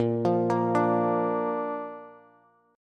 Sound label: Bb Major, Bass; 18,20,20,19; Bb arpeggiated; JGuitar